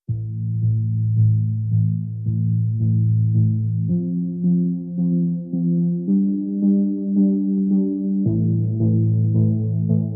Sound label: Bell